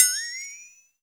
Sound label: flexitone